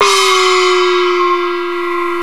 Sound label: ENSONIQ FU YIN GONG